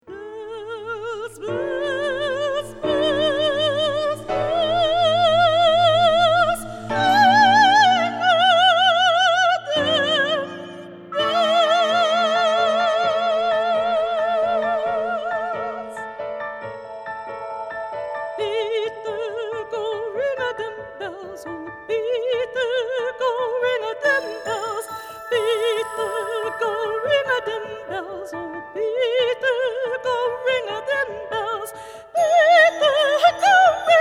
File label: cantata prelude rondo